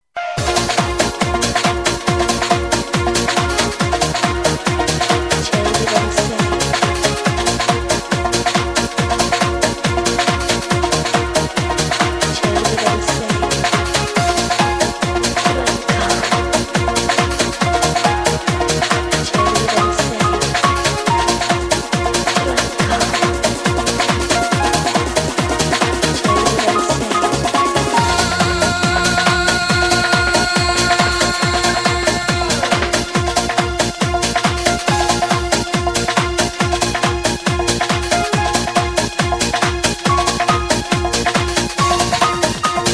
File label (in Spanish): musica; Dinamic Multimedia 2000